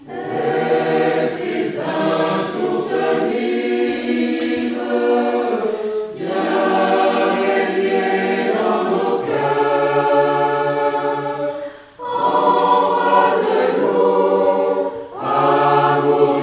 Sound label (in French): Tutti; Esprit Saint source vive